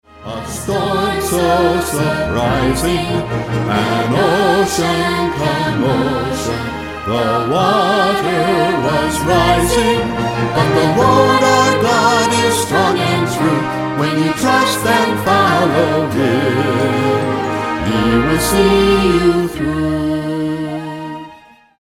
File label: ocean commotion